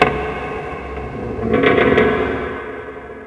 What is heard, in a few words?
buzzer; svencoop; sence; mistake coop